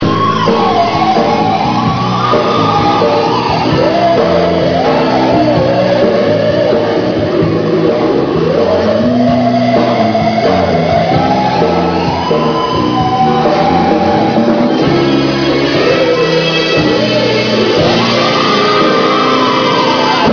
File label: theremin